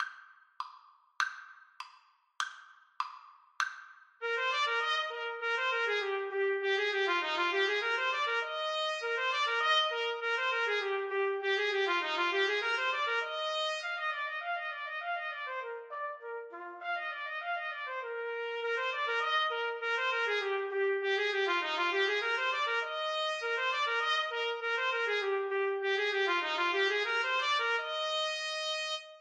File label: trumpet duet